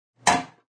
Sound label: Pot bangs.; Pots and Pans; Restaurants